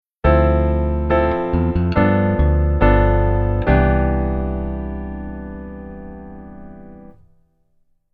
Keyboard Voicing Rootless

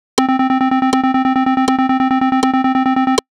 Rhythm Note

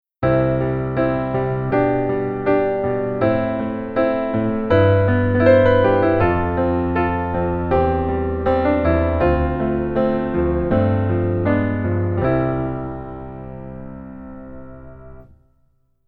keyboard

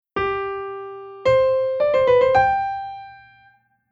grace note

